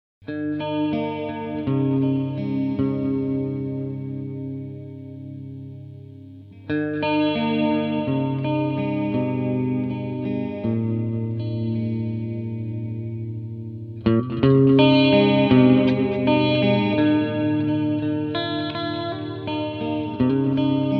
Clean Chorus Delay

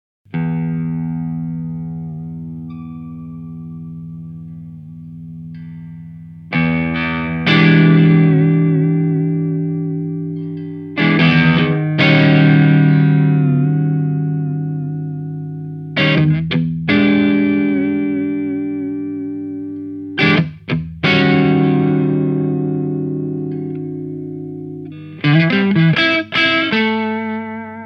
calm

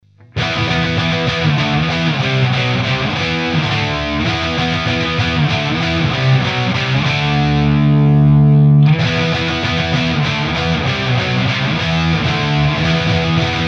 Digitech RP255

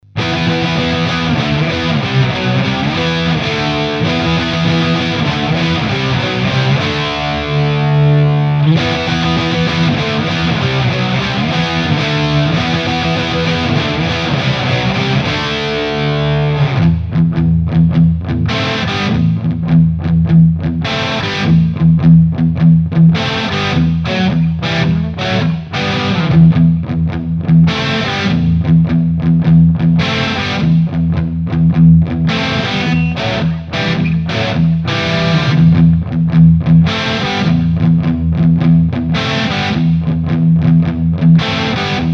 Custom 3 Canal Plexi OCD, PRS Custom 22

